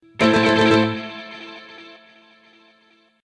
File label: win Scatter; Sugar Town